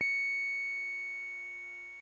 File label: bbm, Omnichord; OmnichordGodot